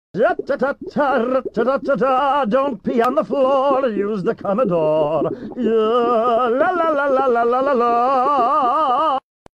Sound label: Fish singing dont pee on the floor